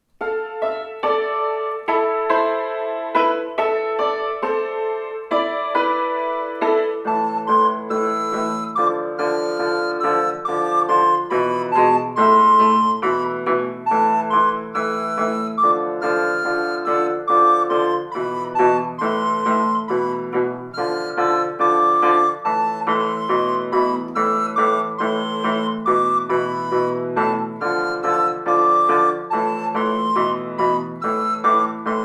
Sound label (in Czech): flétna + cimbál; Otvíréte nám juž; Flautoškola 2 – METODICKÉ NAHRÁVKY a DOPROVODY